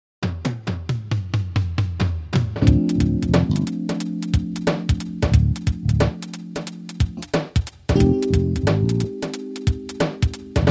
West Coast Swing

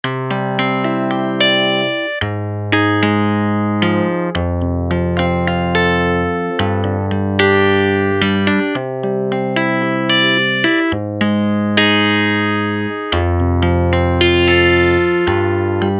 FM Electric Guitar; ChorX

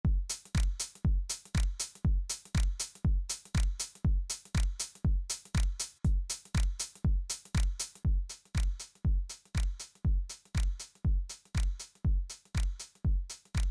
Drums; XILS DS

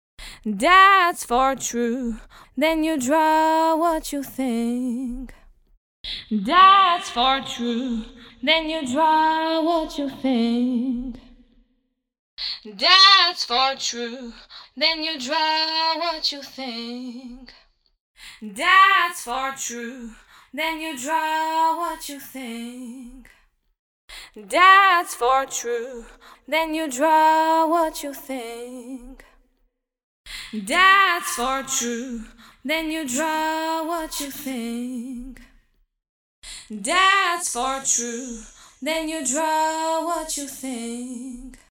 Vocal; RingX

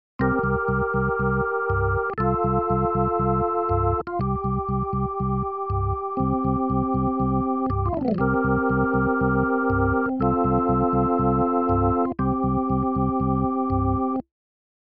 Slow Fast

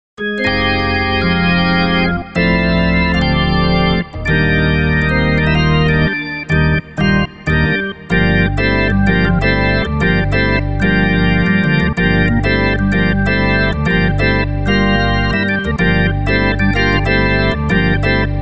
Organ; ChorX

